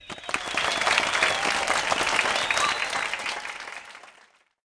cheer; petitioners

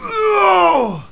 pain; KINGPIN; male

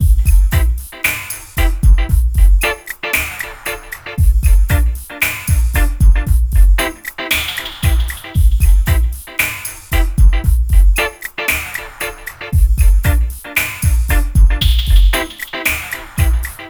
reggae style, grooves

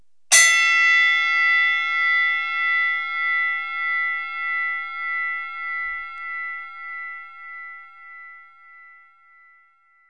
boxing, bells; citylife

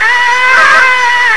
AHAHAHAA, VOCALS; Groovy Bytes: Behind the Moon

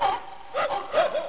BARK

